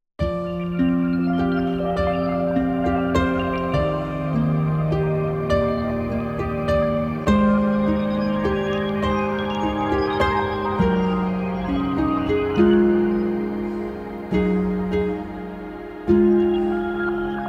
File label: Harp Solo; Hume